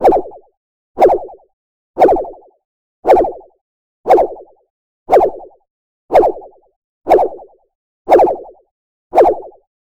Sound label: quick projectile sound